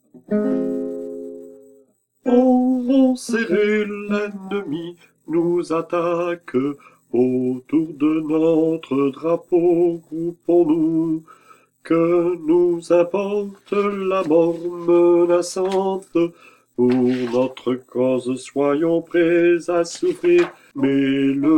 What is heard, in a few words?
varsovienne alto, chorale